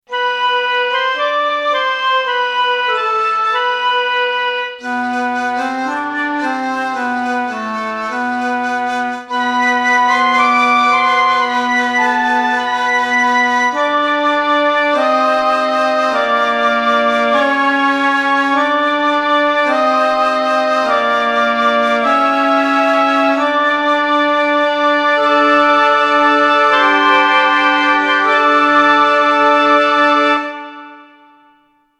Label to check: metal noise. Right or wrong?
Wrong